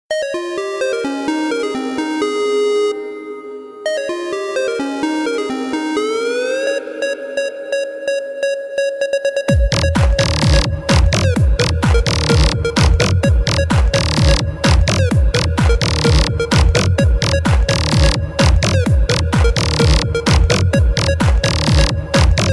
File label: Nokia Future Mix